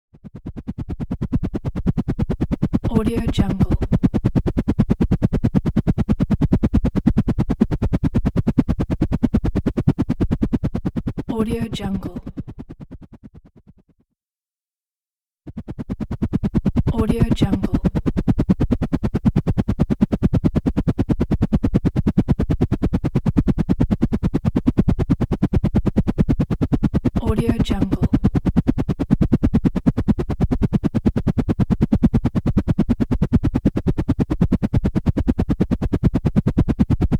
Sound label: helicopter